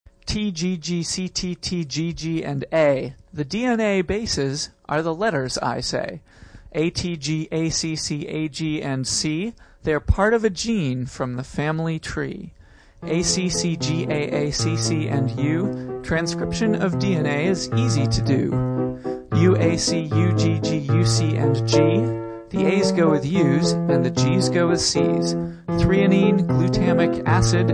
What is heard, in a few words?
Waltz